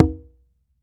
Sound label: H CONGA, CONGA